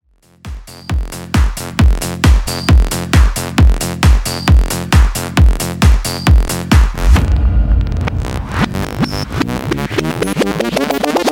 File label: House Redrum 134Bpm